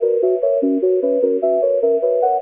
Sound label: flutey, Hats Electro Closed